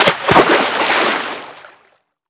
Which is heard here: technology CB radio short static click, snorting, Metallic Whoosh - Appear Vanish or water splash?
water splash